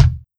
Kick

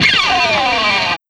Guitar RIP; pitchglitch

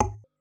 perc; foxdot default

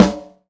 Snare Rock; pitchglitch

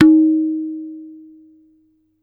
tabla tun

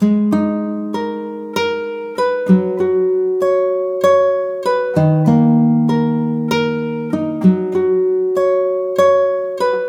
guitar loop

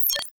Game Bleep

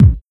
Kick Dubstep

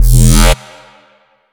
lazer; foxdot default

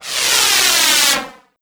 Rocket; Hi Tech

